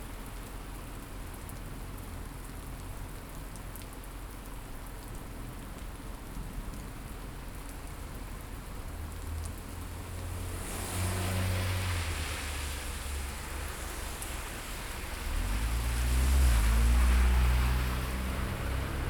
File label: Stereo, walk; Field recrding walk